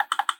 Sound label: double click